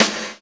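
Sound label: Snare, Roland; Real Snrs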